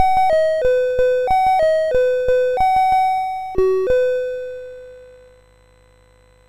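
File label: Mr Wiz, MOS 6581 SID chip music, games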